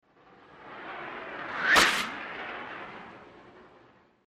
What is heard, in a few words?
Fast Blow Torch By With High Pitched Whistle On By